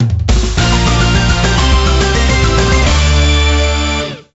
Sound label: Mirror Success; Kamen Rider Climax Heroes OOO; psp